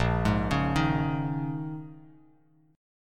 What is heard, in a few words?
Bb Minor 11th Bb down Bass Standard; arpeggiated; JGuitar